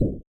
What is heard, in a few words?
Bump; super mario excitebike